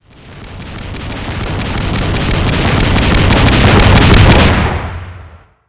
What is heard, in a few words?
epic blast